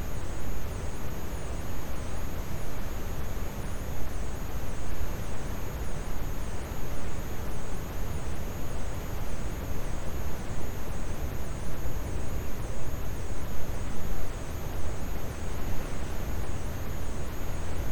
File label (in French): cachalot negatif, Physeter Macrocephalus